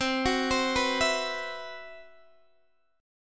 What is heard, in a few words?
C Major 7th Flat 5th C, Guitar DADGAD